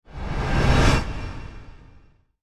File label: transitional swipe; ai interactive comic book